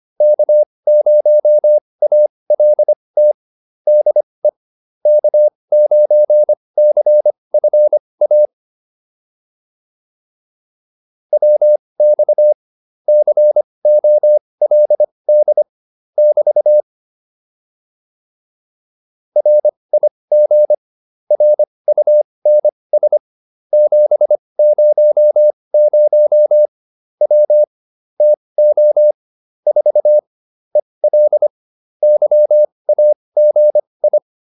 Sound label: QSO, advanced practice files